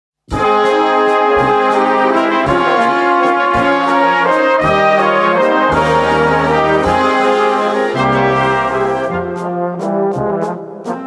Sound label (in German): sehnsuchtswalzer